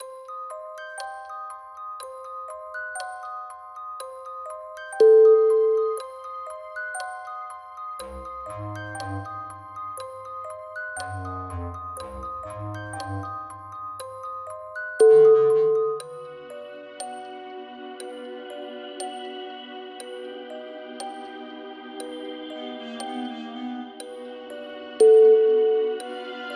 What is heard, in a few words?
clockwork horror